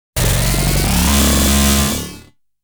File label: Ending flight sound